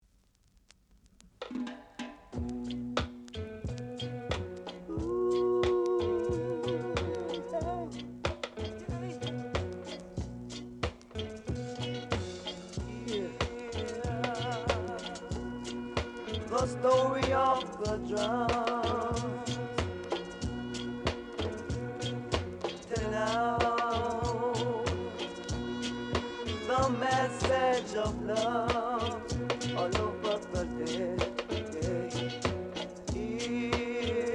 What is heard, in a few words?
drum